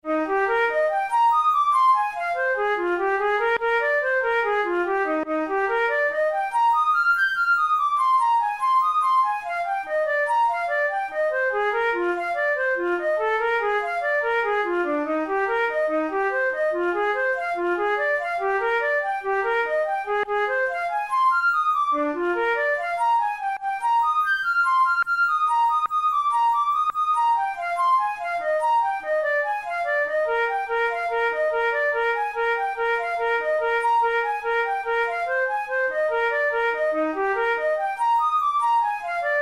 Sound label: methode pour la flute study; drouet